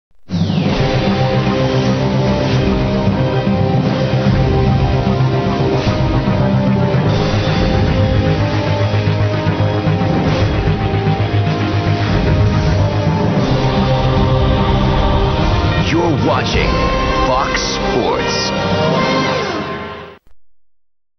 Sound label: jingle; fox